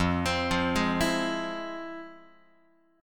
F Minor Major 7th, Guitar, chord; FmM7 arpeggiated; JGuitar

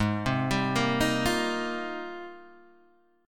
G sharp Major 13th, Guitar, DADGAD

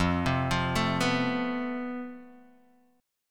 F Major Add Sharp 11th, guitar chord; arpeggiated; Fadd#11; JGuitar

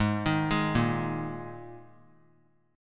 Ab Suspended 2nd; arpeggiated; JGuitar

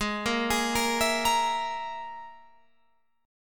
Ab Suspended 2nd Sharp 5th Ab down Guitar Open F; arpeggiated; JGuitar

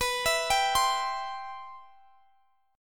C Major B

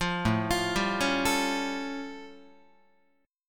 A Augmented 7th A Guitar; arpeggiated; JGuitar

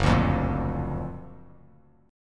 F sharp Augmented Major 7th; strummed; JGuitar

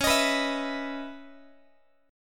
C sharp Minor 9th C sharp Guitar; C#m9; strummed; x,21,18,21,20,x

